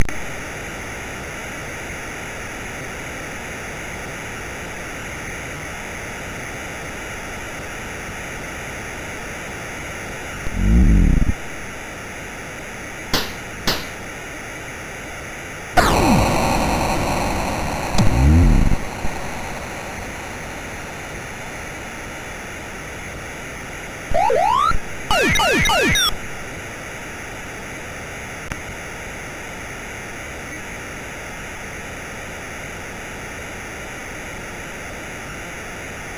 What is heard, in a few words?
Big Funk plus FX, MOS6581R4